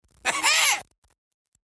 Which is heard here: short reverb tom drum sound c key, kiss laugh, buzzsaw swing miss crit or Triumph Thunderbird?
kiss laugh